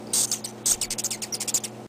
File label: hummingbird